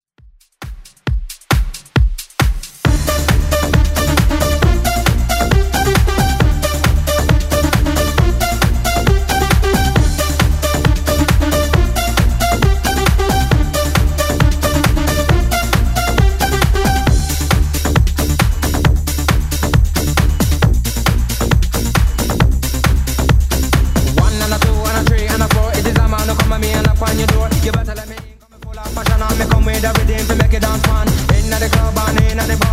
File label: 90s, 135bpm; Redrum